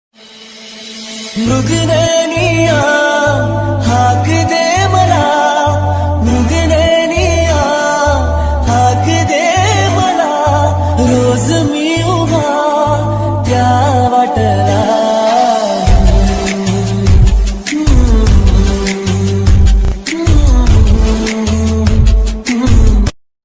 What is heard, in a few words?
marathi ringtone song ringtone